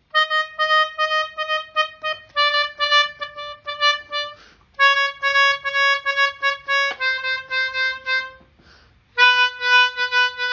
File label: Descending Turn